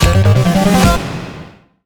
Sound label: Jingle - Upgrade Acquired; Bionic Commando Rearmed